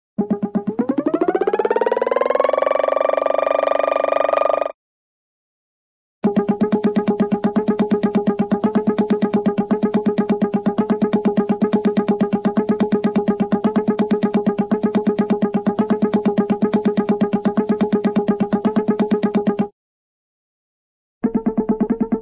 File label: sci fi jet pack start; cartoon